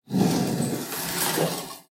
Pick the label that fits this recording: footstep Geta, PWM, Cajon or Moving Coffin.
Moving Coffin